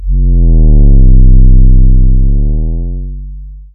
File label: Phat Implosion; Zero-G; Soundclash Nu Dance Electro; Acidized Wavs; FX Atmospheres